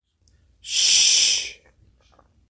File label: Shush